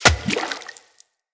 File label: Bow Arrow Impact Water; Weapon Impacts; Player; Bows; Environment; Hunter; Envoy Hunter Bow